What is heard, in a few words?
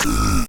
Undead Abbot Hit; Blind Quest - The Frost Demon